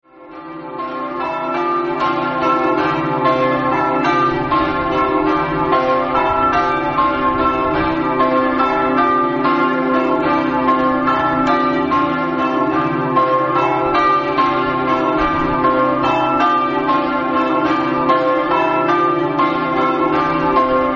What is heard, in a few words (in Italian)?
campane